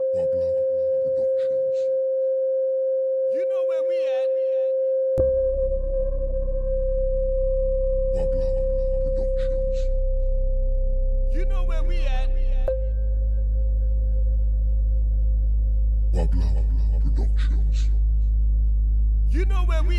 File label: Reggae Dancehall